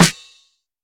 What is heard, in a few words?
large room steel snare drum sound d sharp key